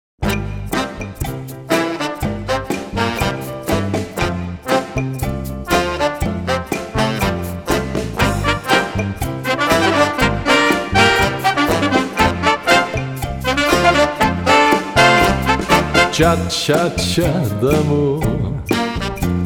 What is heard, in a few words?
ChaChaCha